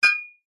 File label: foley bottles x2 beer full single knock together clink; zapsplat